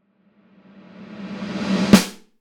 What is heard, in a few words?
slushy snare